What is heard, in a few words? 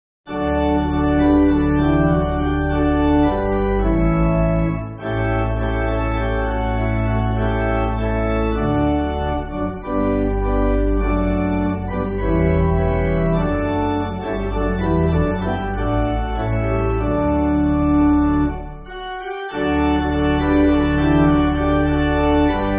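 Pipe Organ, Backing Files for Churches; With What I Will Exchange; Schofield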